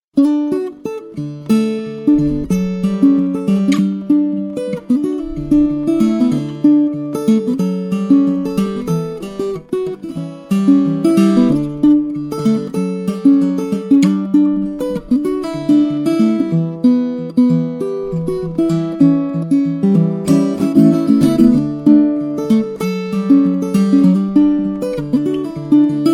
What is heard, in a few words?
uke moms song